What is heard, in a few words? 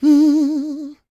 MMM, Vocal Blues Soul; Spectrasonics; Planet